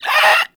Infested Runner Pain; Warframe; Runners

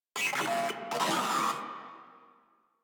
Moa Vocal Attack; Enemies; Warframe

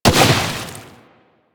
Bubblegun Pistol Hit Explosion; Warframe; Weapons; Tenno

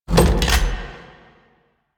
Weapon Pickup Heavy Mech; Warframe; Archwing; Weapons

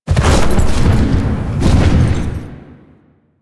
Horse Glide Start; Vehicles; Duviri

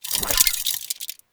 Sentient Metallic Move, Enemies, Sentients; Sentient Movement

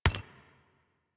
Flak Cannon Shell Impact

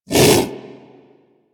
Predasite Vocal Bark; Warframe; Hunting Critters

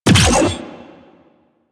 Detron Power Layer B; Warframe; Weapons; Corpus; Lich

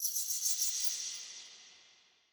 Under City Bat Chatter Distant

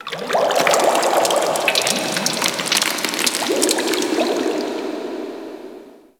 Ocean Cave Crack Small; Warframe; Grineer Ocean

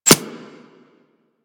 Arrow Impact Default; Warframe; Weapons

